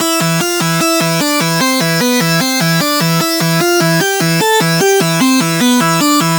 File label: Lead Loop E Organ Pattern, 150bpm; Dubstep; Ghosthack; Dubstep Sound Arsenal